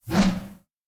swing blunt 2 hand

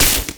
lightning impact

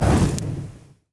flames impact; fire; skyrim

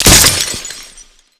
icicle impact break; frost; skyrim

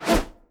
blade 1 hand swing

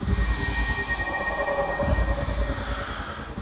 mag conjure ready lp, conjuration; skyrim

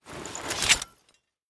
axe 1 hand sheathe; equip

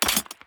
weapon up; skyrim

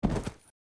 bear foot back; npc

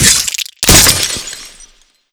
icicle sticky impact break, frost; skyrim

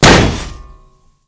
shield heavy block big

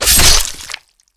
kill stab in

